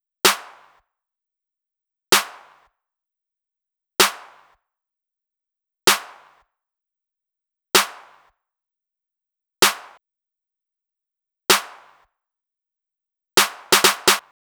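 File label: Drum Loop 128BPM snare; VEC5 Drumloops; VEC5 Multi Loops; Loop Elements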